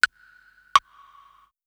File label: Clock loop; Rosegarden; russia